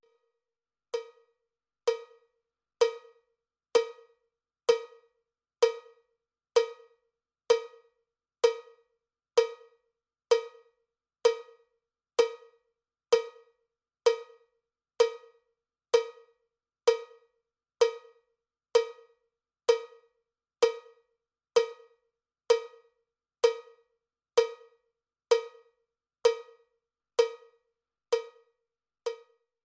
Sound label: cowbell click track bpm 79; cowbell click track bpm 64